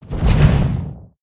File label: Rock Rumble; ambience